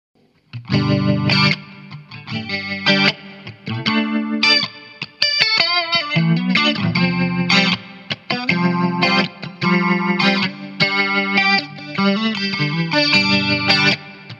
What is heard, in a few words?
Rotary fast, gitar